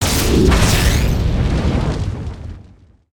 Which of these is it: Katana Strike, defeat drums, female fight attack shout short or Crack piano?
Katana Strike